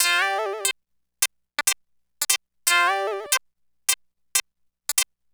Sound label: Keyboard